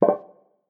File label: Pick Up Piece; Martian Chess Audio